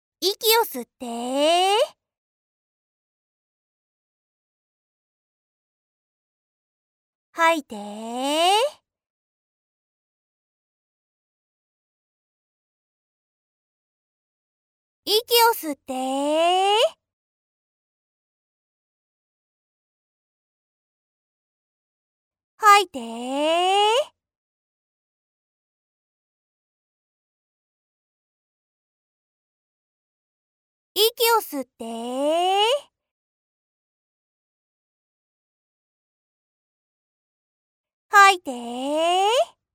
息の吸い吐き3分間8秒間隔

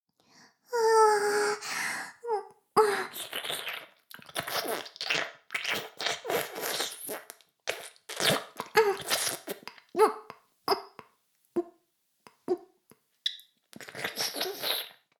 フェラ音声; 古き城のドラゴニート2

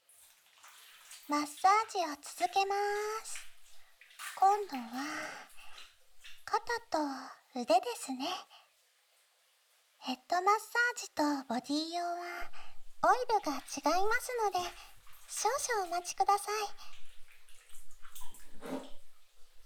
ボディマッサージ, ASMR

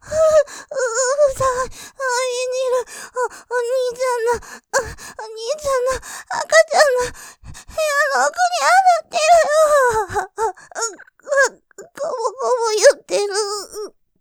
絶頂喘ぎ; 精液ソムリエ; 赤ちゃんの部屋の中に当たってるごぼごぼ感; ループで楽しむ！シェフの気まぐれ抜き抜きコース; モノラル

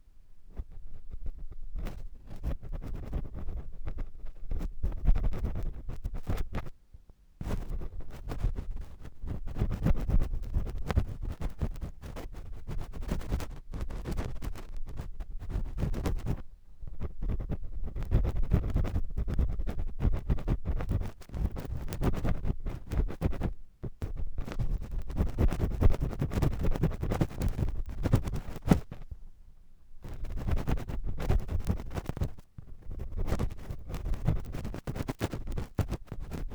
片側 高速耳かき ステンレス; SR3D

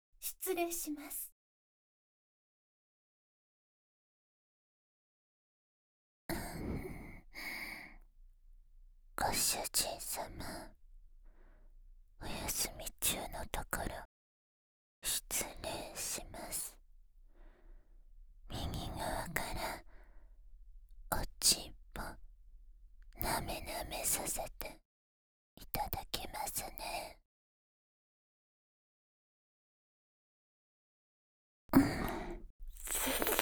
癒し重視のフェラチオ 右

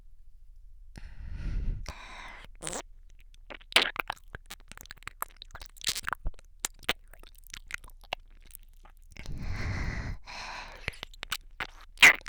れろれろ 耳舐め

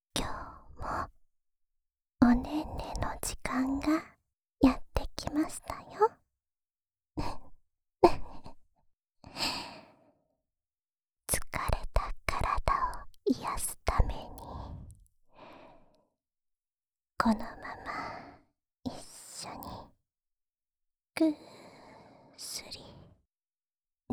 安眠用添い寝ループ（右耳）, ASMR

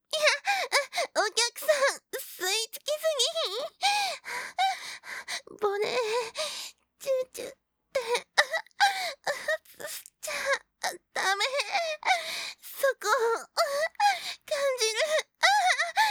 喘ぎ 連結版 余韻なし（ループ用）; うさみみの地下アイドル＋コンビニバイトBさん

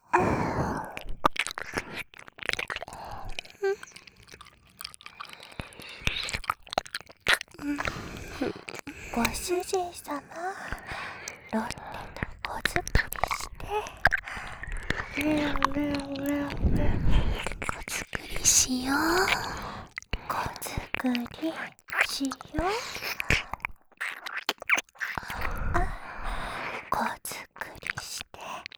耳舐め

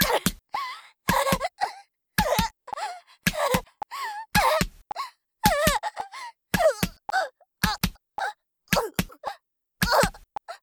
ワンツーメト BPM110

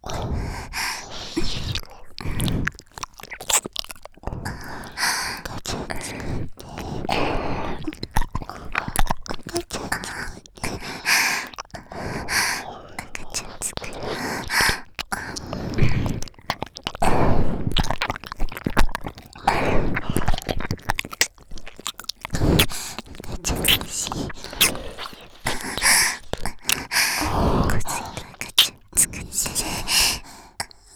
耳舐め喘ぎ; 赤ちゃん作ろ♪

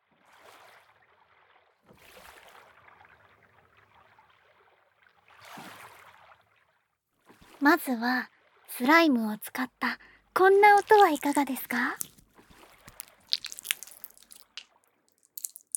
スライム～砂音～木のブロック

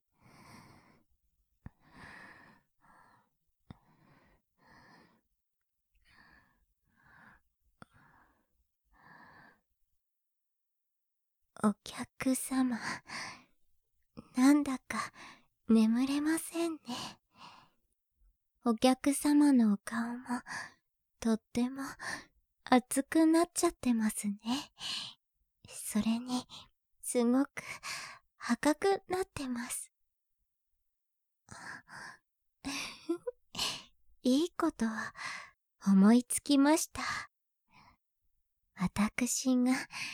お耳…舐めちゃいます(右), ASMR

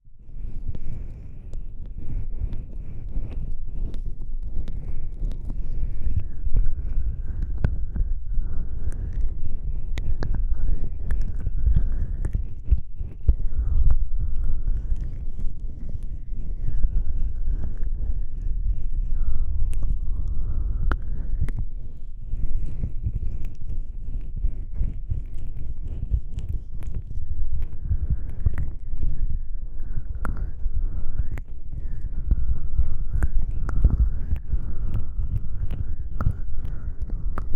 医療用シリコン耳でオイル耳マッサージ-両耳同時-

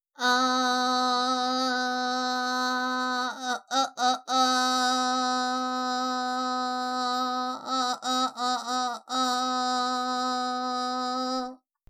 あーーーーーーー; バイノーラル

